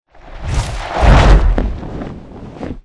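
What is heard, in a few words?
flyer land medium distance; boneyard; Titanfall 2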